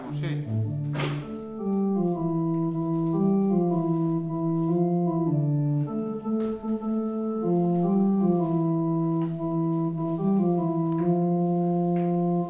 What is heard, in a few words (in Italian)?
tenori STRUM VOCI; TENORI STRUMENTALE e CANTATO